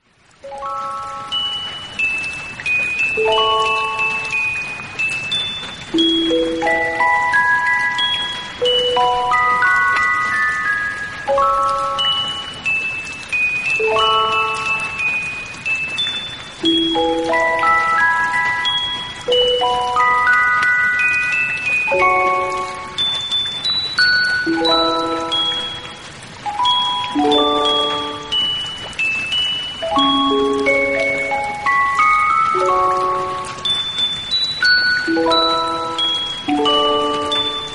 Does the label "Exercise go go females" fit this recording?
No